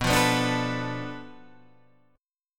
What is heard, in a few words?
D Minor 6th B, guitar chord